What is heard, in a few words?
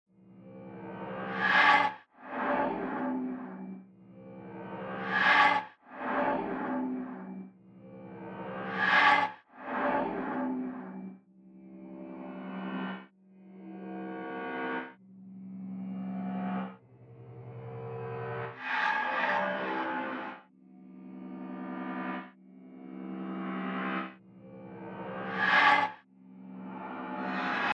Perc FX Short Drones